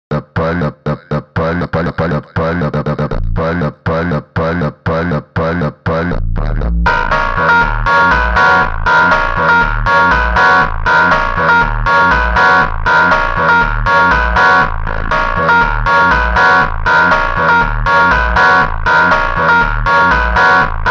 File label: Montagem slowed; فانک